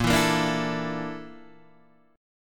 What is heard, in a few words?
A sharp Major 7th Flat 5th A sharp Guitar Open D